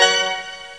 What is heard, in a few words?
Push Menu